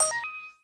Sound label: gem get